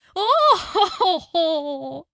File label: Manager Laugh; Candy Crush Soda